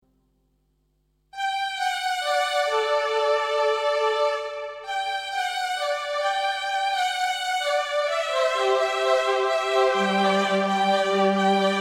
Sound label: Pads And Stringers, a display of some classic stringer patches; Korg 05R/W